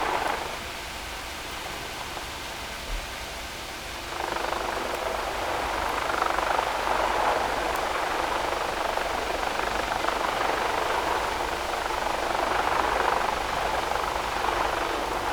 No Ship, helicopter

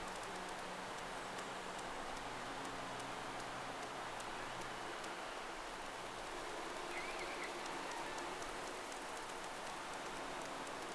Whales; SeaSnake

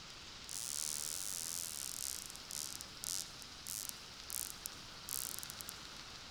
click train

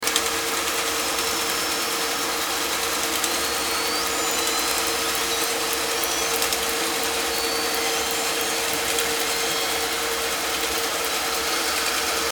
Orca Call under Boat Noise

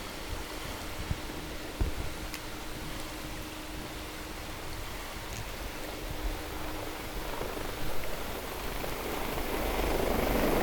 NoShip, helicopter

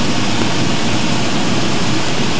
Unknown Navy, Sea Sounds

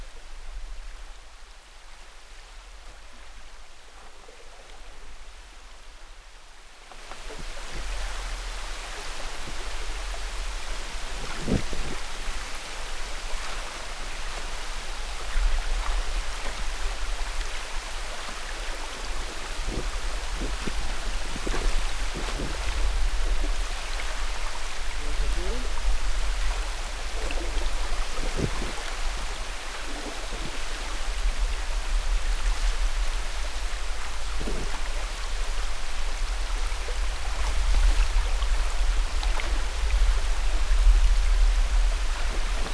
Heaving To, ships

